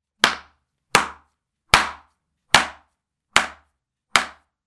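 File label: slipper sound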